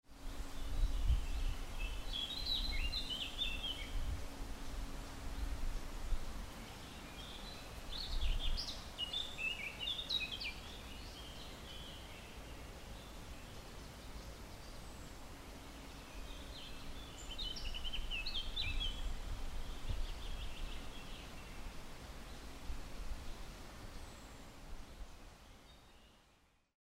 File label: Foley